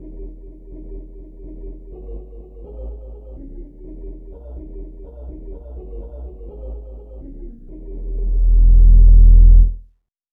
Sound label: piccolo; bass